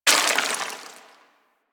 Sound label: Melee Heavy Air Slam Impact Dirt; Weapons; Whooshes; Warframe